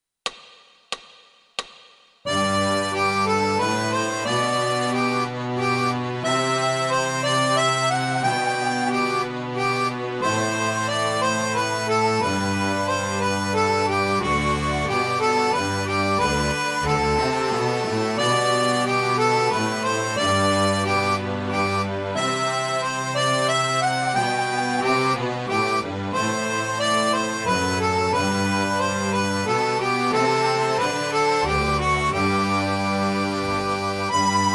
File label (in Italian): Minuetto in G, Fisa tutti